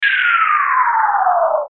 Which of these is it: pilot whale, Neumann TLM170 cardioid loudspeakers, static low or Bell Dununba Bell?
static low